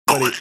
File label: trying to replicate thinking quack